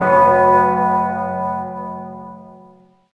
Bell; Playable Tutorial Level

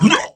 Boss Attack; Puna; Playable Tutorial Level; TR3

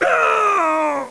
Skateboard kid Death; Playable Tutorial Level; TR1

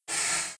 Breathe In; Hydra; Playable Tutorial Level

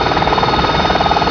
Helicopter Loop; Playable Tutorial Level; TR2

